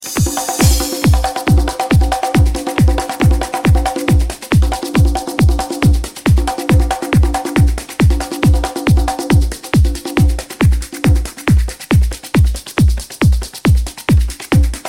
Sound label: The Tribal Session